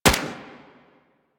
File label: Sundial SMG Shot, weapons; Warframe; Tenno